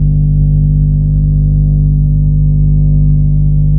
transformer, underpass; ambient

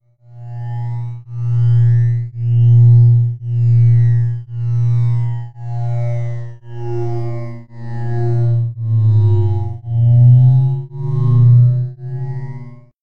psy l, ambient

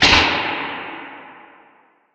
switch

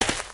earth, human step

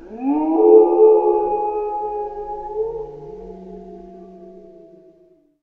howling, ambient outdoor

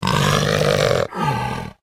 pseudodog death

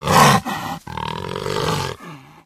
pseudodog idle

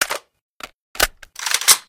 vintorez reload, weapons

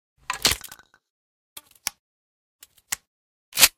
toz34 reload, weapons

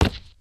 wood, human step

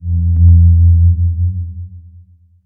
rnd dark, ambient outdoor

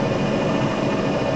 motor run, bridge

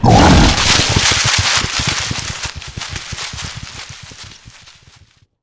boar swamp runaway; monsters

